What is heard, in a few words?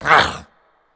ram attack; bumbino